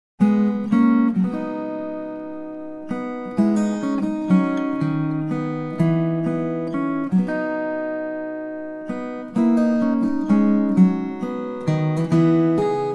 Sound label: Peaceful Guitar; Guitar Aram